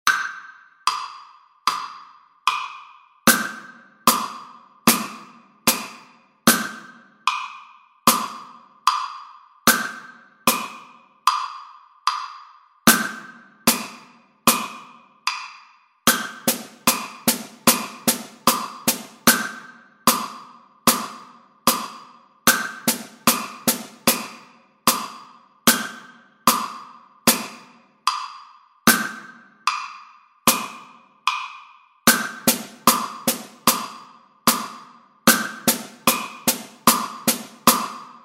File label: drum set